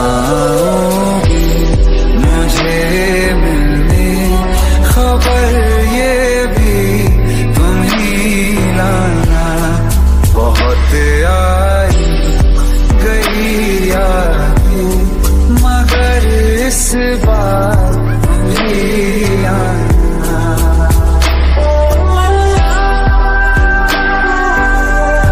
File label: sad status ringtone, bollywood ringtones; whatsapp